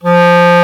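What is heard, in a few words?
Clarinet mf F2; Multisamples; Digital Sound Factory Virtuoso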